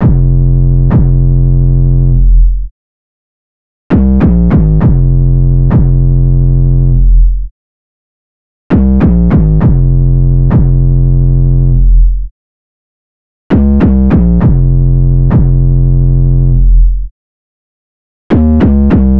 808 loop 100 Fm; Slate Digital BASSHOLE Sample Pack; 808 Loops

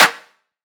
snare oneshot; Pop Sample Pack; Slate; SD PASADENA Pop Sample Pack

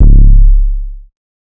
808 one shot C#; Slate Digital BASSHOLE Sample Pack

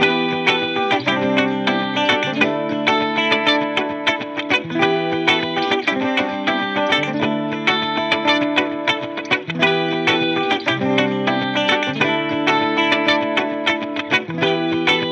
guitar loop 100 Em; Pop; Guitar Stacks; SD PASADENA Pop Sample Pack; Slate

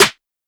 snare mash; Hip-Hop; Drums; Drum One Shots; Slate; CAICOS Hip-Hop Sample Pack

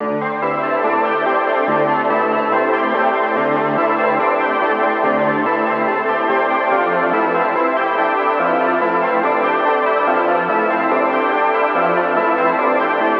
songstarter 142 Am; Slate; SD PASADENA Pop Sample Pack

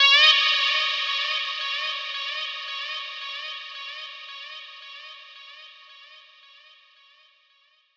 poly slide D#m; Melodies; One Shots; Slate; AURORA Sample Pack

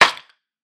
snare lofi rattle; one shots; SEISMIC Drum Sample Pack; Slate

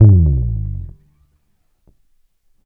Bass Dive FX Dark, Electric Bass One Shots; Slate Digital BASSHOLE Sample Pack

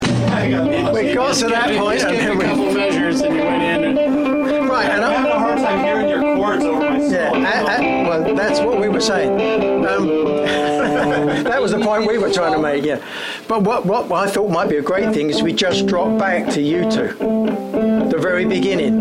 background binaural

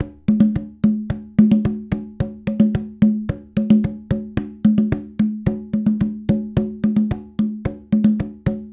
latin conga loop, 110

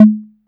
Conga Crunchy, 808; 808 Congas; Slate; Vintage Drum Machines Volume 2 808 Edition

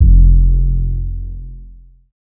Synth oneshot Long F, Synth Bass One Shots; Slate Digital BASSHOLE Sample Pack

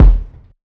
kick oldschool; Drum Sample Pack; One Shots; Slate; SEISMIC

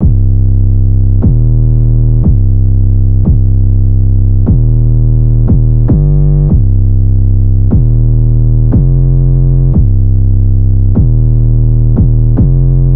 808 loop 148 Cm; Slate Digital BASSHOLE Sample Pack; 808 Loops

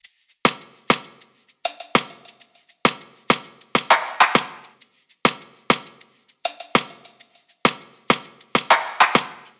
top loop, Drums, Loops, Top Loops, Pop

